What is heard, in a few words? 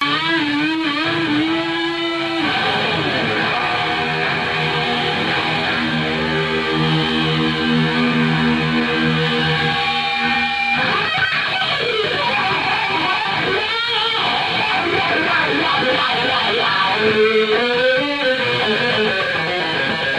Guitar Solo